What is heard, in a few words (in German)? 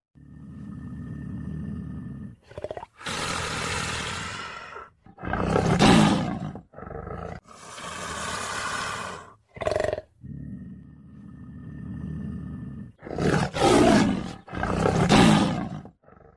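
Löwe; zootiere erkennen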